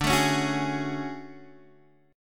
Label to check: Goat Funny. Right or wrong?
Wrong